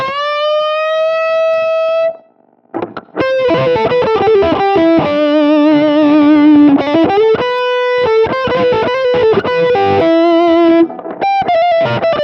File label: stems Dry